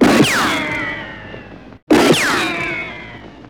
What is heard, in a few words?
military gun shots; BRUITAGES